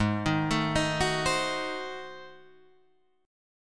G sharp 7th Flat 9th G sharp down Guitar Drop D, chord